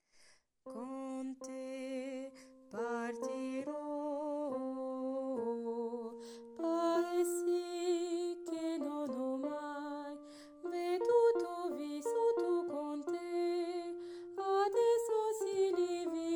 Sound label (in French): basse